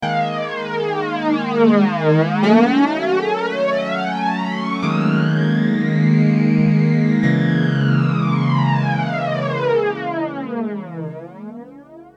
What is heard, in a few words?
m1000 ibanez fl99